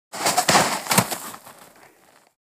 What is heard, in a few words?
The subject is thrown into the snow